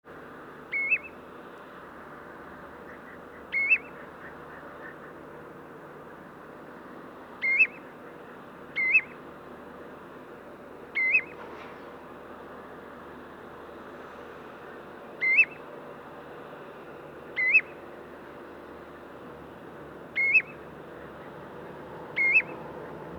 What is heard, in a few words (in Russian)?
galstuchnik golos